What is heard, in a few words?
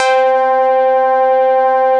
Synthesizer Middle C Notes, Lead